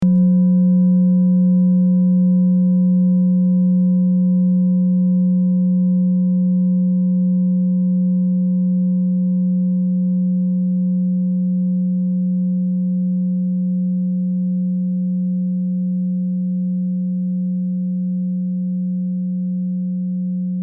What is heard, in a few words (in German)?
klangschale set; Klangschale Nr.1; Gewicht = 1340g; Durchmesser = 21,9cm; Höhe = 9,3cm